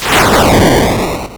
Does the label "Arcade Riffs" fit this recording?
Yes